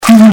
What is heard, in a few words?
evil pain; CAC ASSETS